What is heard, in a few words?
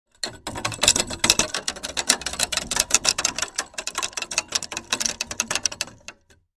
Old rusty paint roller squeaking